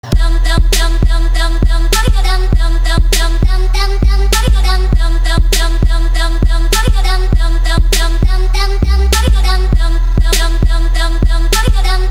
Dum Dee Dum; cstrike; csgoremake2019mvp

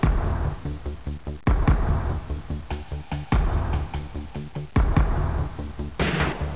stone cut; cstrike; stonecutter

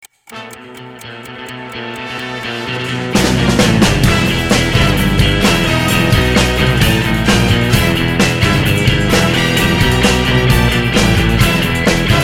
game startup; cstrike

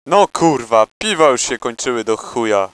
startle; cstrike; hosty

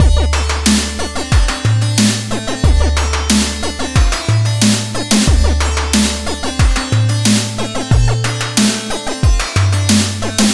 country twang; cstrike

